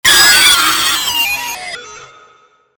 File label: arcade shutdown jumpscare sound